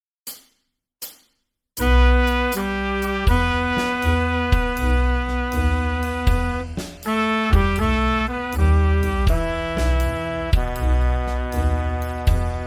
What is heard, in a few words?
Summer time 80 bpm, ukulele; podklady